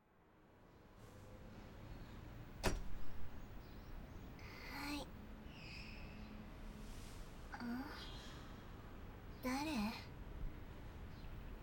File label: Off SE, ASMR